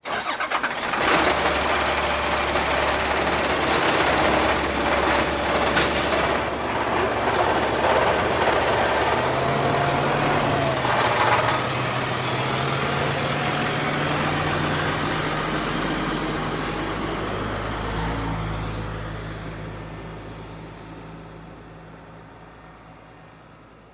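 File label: school bus away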